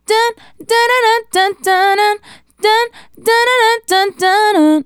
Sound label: Female Solo, Vocal